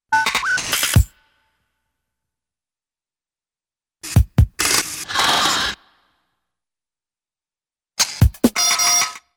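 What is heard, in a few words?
Short Musical Beats With drums Ratchet, Cartoon; Hollywood Edge; Cartoon Trax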